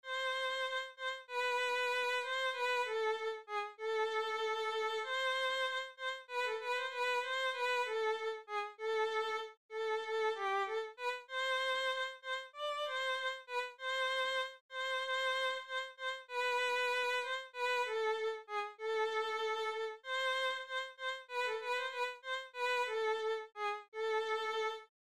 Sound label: Helas Violin